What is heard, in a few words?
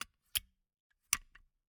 Gumball machine, candy door, plastic, open, shut, wide; Eiravaein Works; Vaeyan IV